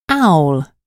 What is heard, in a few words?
owl